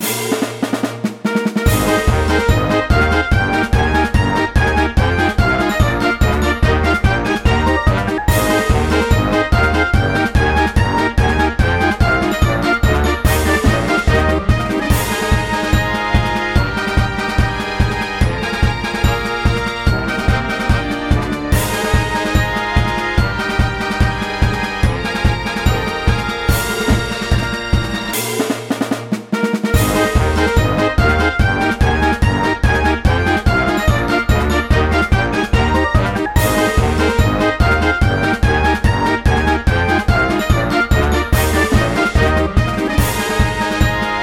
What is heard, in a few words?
grass battle normal boss; world grass